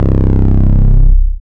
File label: 808 fold